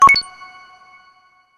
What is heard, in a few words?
Blip, Stock Notification; HTC Touch Pro2 And Diamond2